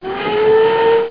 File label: Moto